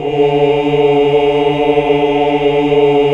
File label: OOH. OOOH Uni